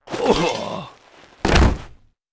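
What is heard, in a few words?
monster death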